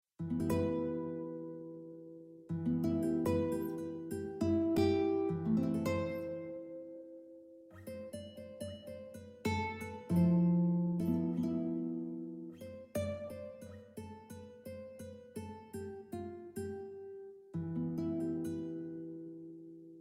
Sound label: Nylon String Guitar; sibelius